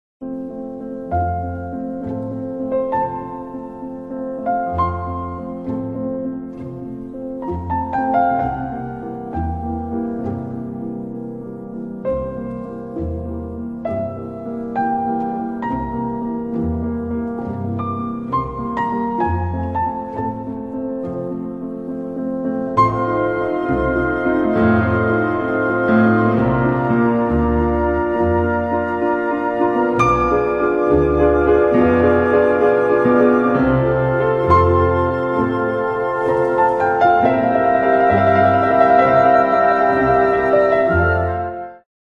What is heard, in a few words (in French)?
Concerto pour piano et orchestre, Theme; Mozart